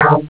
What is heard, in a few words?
bump